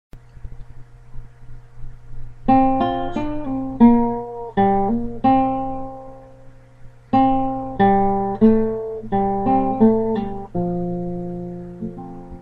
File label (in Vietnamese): Biết Bao Là Hát Lửa, Basso